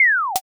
sheath

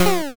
damage

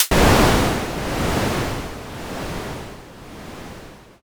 thunder

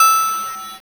ding

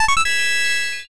notify superchat

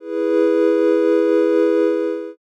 strings

